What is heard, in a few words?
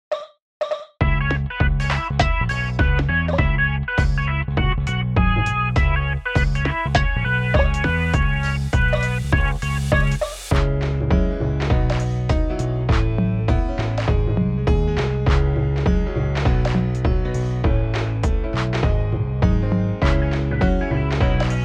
inst off Vocal